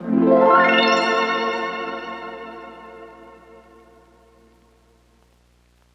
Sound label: poly harp F#m; Melodies; One Shots; Slate; AURORA Sample Pack